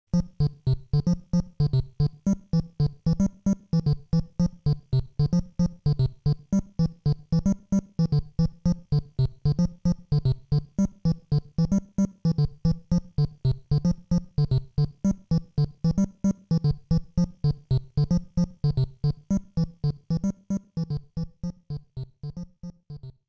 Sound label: Level BGM; Game Sound Museum Famicom; Donkey Kong Jr